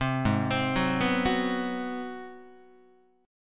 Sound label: G sharp 7th Sharp 9th, Guitar; G#7#9; arpeggiated; 3,4,3,4,3,5; JGuitar